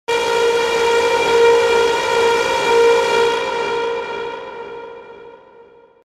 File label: noisesound shaper fx